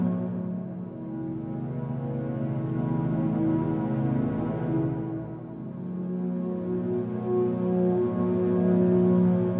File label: Trance; ゆめ2っき